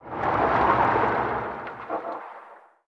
Bridge Up; Myst III Exile

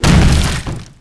hit wood; WhiteOut; XBOX; crested

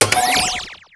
bonus; Pure Pinball

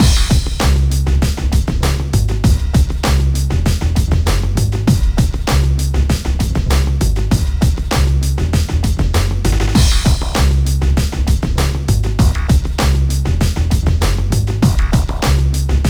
groove; Matt Hoffman's Pro BMX 2; XBOX

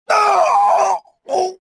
death, prisoner

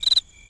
cricket; Tony Hawk's Project 8; XBOX; inschool

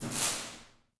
spark republic cruiser; Star Wars Obi Wan; XBOX

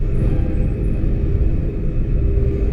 engine room, cargoliner; Soldier Of Fortune II Double Helix; XBOX; ambience

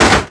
white chair hit; bouncyobjects; Tony Hawk's Project 8; XBOX

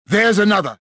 anger; jedi

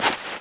Scuff Dirt; Player; Footsteps

